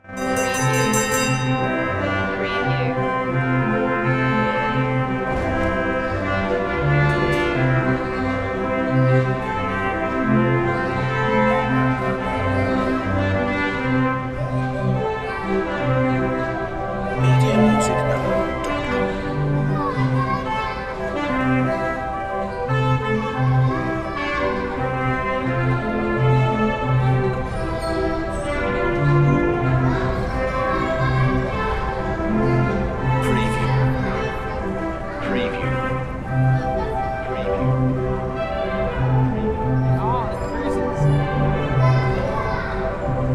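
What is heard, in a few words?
Merry Go Round; Game Sounds / Amusement Park / Arcades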